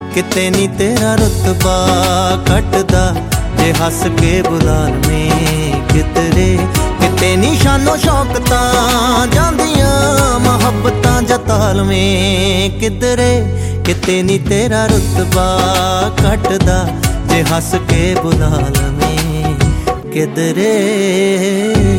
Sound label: Ringtone